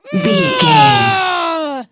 voice female a battle shout

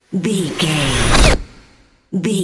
magic conjure charge

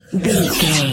ui menu scan

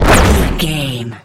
energy blast large

